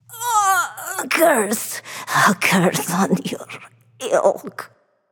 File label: death; smite; babayaga